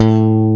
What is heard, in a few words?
BASS A-1; WORS STATION; ROCK